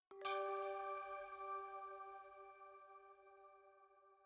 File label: item Jingle Chime Only G